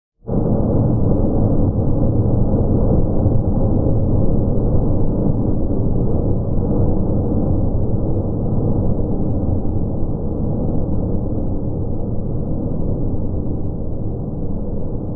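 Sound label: Electric Scrape Reverb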